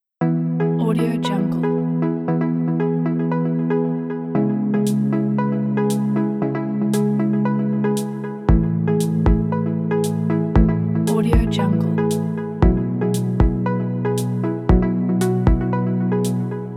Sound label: corporate inspiring upbeat uplifting